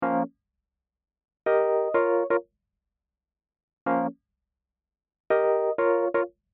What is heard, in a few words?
E Piano